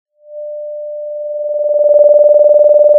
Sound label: pop, Sound of a phone ringing